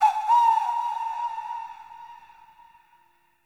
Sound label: Decoy Flute Call; Powers; Fey; Saboteur